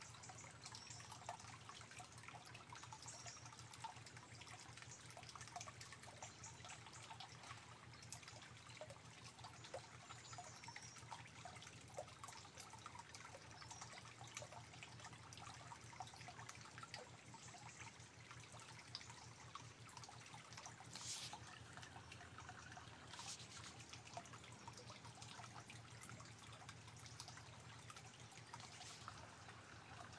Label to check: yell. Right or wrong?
Wrong